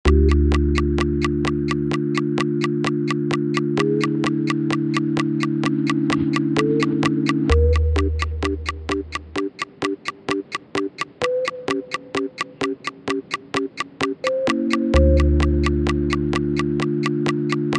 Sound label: robot boss buildup